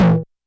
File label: Tom Ana Dist, YAMAHA RM1X